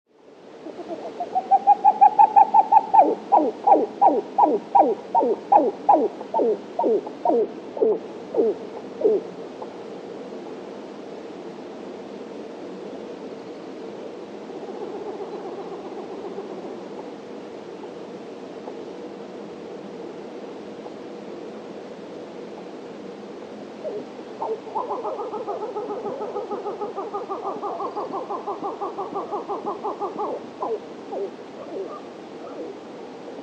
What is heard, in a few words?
atitlan grebe call; gap in nature